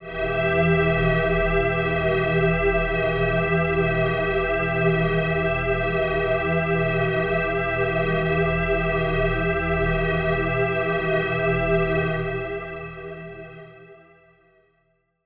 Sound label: One Shot Drone E, dystopian drone; One-Shot Drones